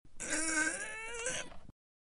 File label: straining